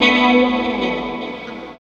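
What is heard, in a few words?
guitar, left; East West - Phat & Phunky CD2; 90's Sample CDs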